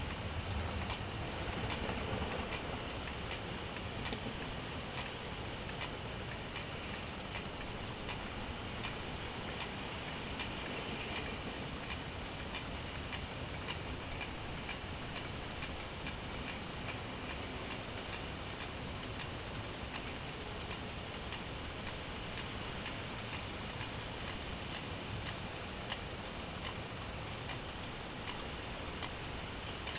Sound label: Physeter macrocephalus; Bombyx1; MedSea